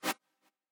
chord

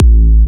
Kick; WAProd Free Kick Samples 2018; WA Kick Pack 6

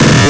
breakbeat

